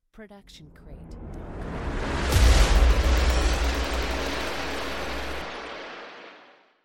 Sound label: designer magical hit; SoundsCrate